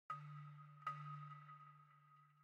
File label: Balafon; Frozen Flame; windows